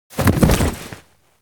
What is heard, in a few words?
combat roll; battle